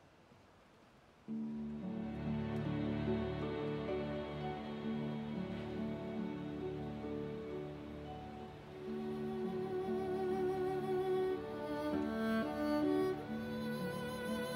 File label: Meditation; Thais